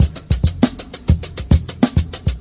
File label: african rhythms, Drum loops Boombap